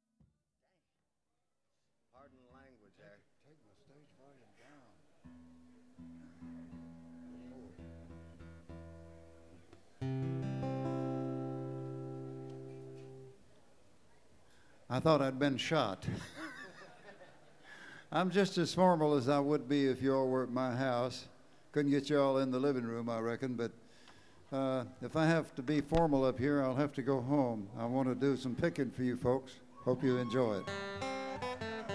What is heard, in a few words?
tuning; Bluegrass; Late Show